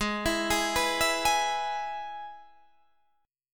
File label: E Major Ab